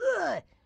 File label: grandma short yell; Blind Drive